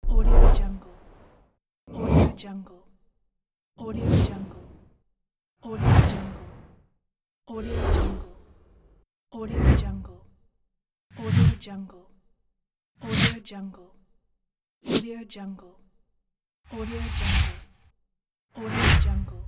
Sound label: whoosh; audioheart